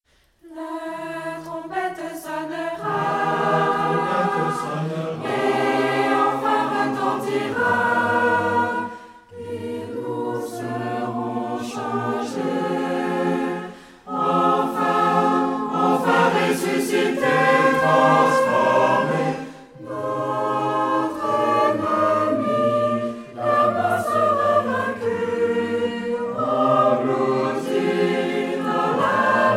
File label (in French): La trompette sonnera